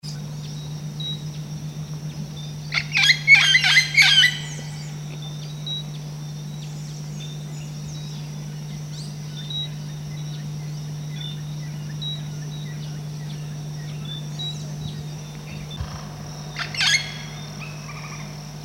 Red tailed amazon; پرنده آمازون دم قرمز

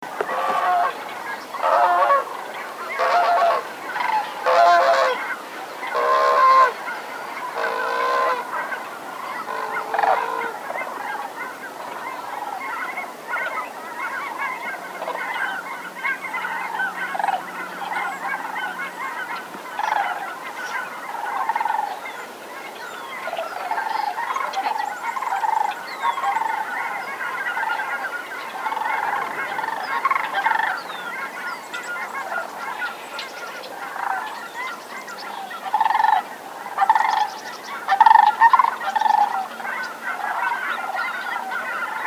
Sandhill crane

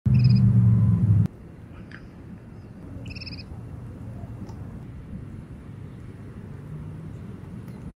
Calidris; پرنده تلیله

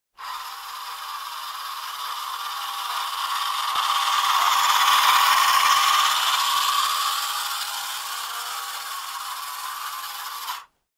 remote control car; ماشین کنترلی

